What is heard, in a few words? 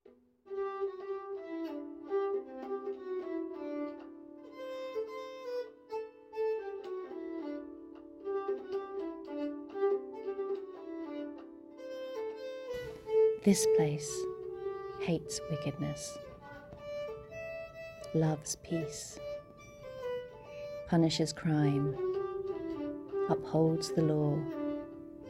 wenlock tune